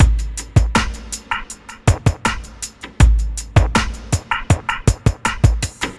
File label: Cellular, ambient